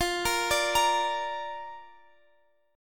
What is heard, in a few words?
A sharp Major F, Guitar chord